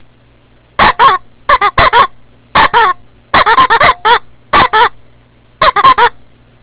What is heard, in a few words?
Horn